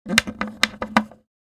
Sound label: Screwdriver woodworking screwing